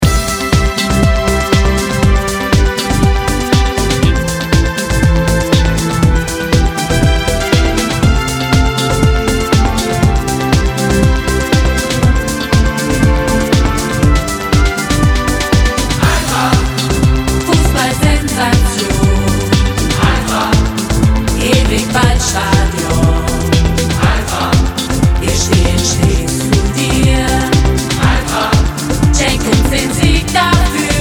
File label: scream